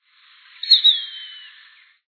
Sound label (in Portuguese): sovi